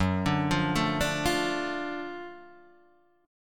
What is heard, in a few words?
F sharp Minor Major 7th Sharp 5th F sharp down Guitar Open D